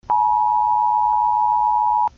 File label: eas bleap